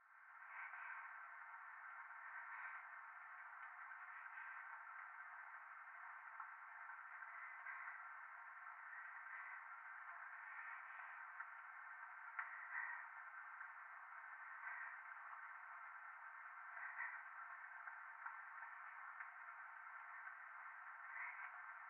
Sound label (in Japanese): トイレ 左耳 密着 吐息 雨